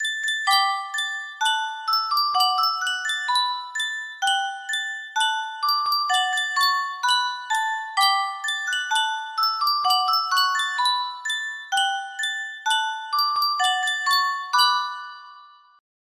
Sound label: yunsheng music box, melody